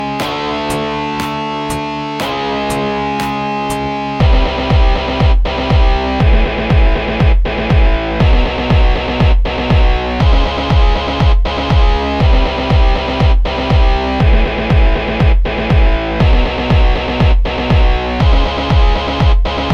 All Styles Beat

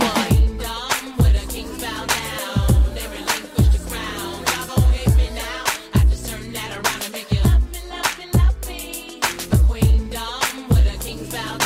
Is Calling Ska, ringtones

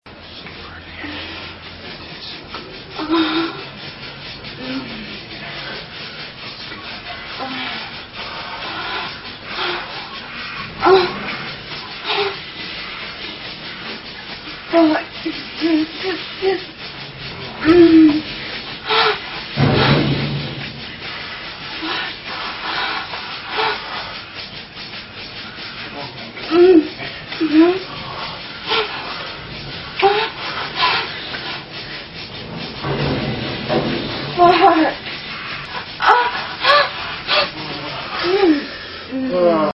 orgasm sound

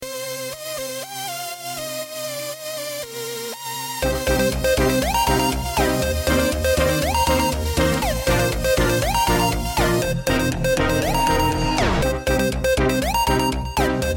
hiphop

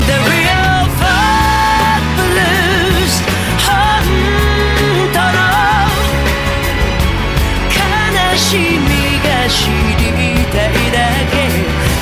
ringtone; blues

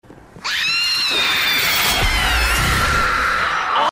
scream; omnigul

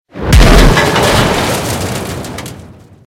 150mm Gun hit

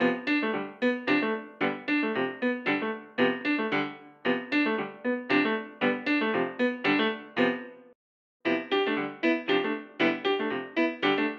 Pianist

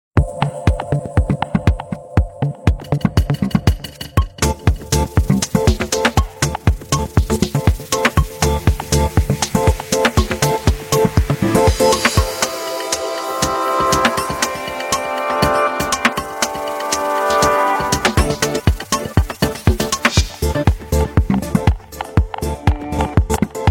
nokia, electronic ringtones

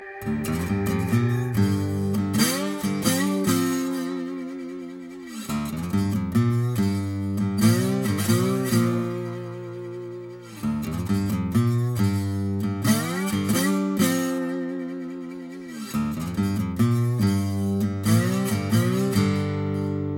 Guitar; sasuke

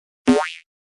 Rubber Sms

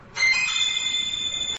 Is Calling Suspense

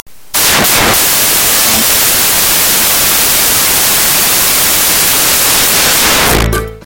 Anime sms

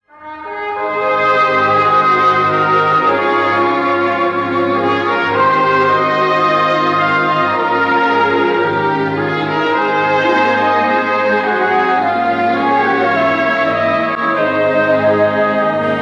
coolest trumpet; latino

